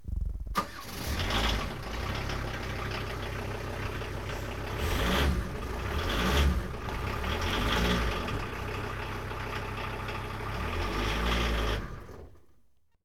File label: auto